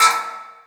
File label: Oneshot